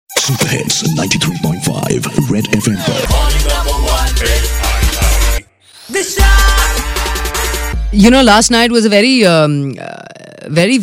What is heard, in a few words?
eating pani puri's